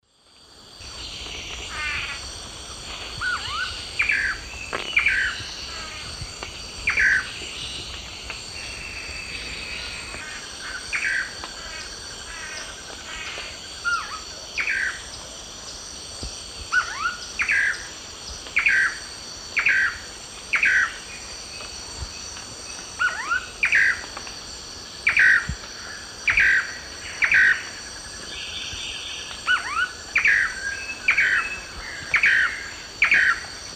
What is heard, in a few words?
Chiroxiphia pareola, song; S. America; Eastern Lowlands